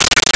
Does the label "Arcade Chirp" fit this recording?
Yes